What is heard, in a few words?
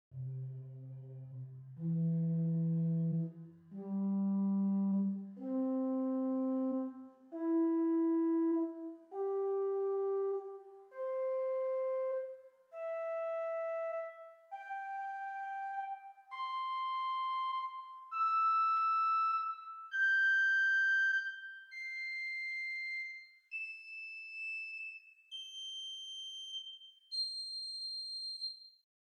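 Swell Flauto Magico 4 arpeggio; Hauptwerk; St Annes Moseley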